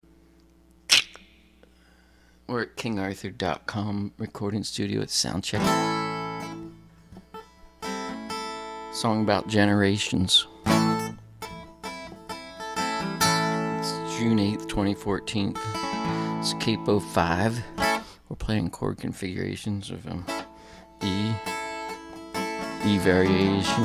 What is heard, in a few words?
Childrens Song Soundcheck; Look Ma